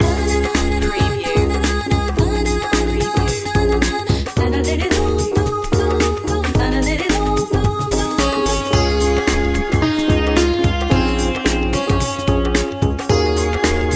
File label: hindu pop loop